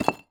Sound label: brick move